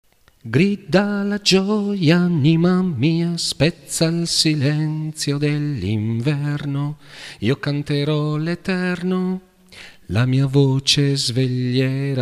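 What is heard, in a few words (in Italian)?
grida la gioia contralti bassi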